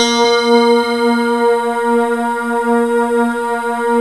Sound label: YAMAHA FM; OTHER SYNTHS; Rubber Chicken Software Sample Bank